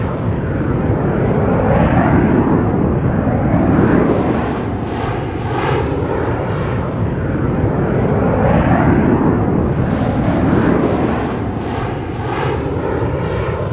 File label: Jet Patrol; Civ4